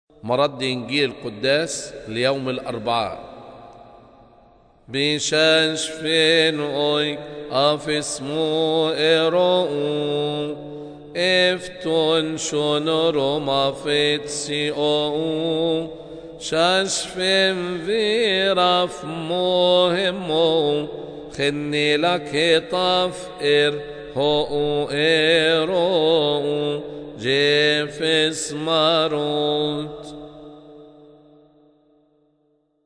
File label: Gospel Response; Gospel Response of Jonah's Fast Wednesday Liturgy